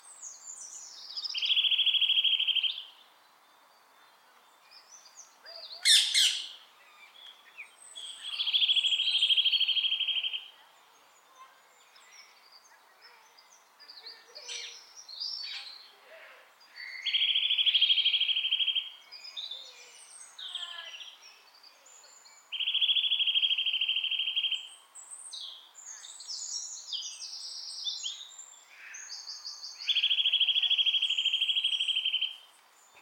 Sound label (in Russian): evrazijskij popolzen i dlinnoshejnij popugaj